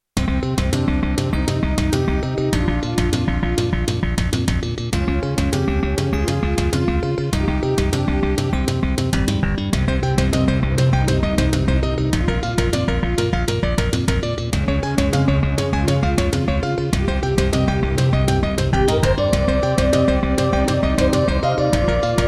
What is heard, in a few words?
Yamaha MU90; Introduction; Hocus Pocus